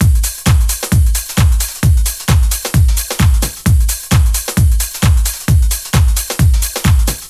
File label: DRUM, 132; Megabass Remix! 2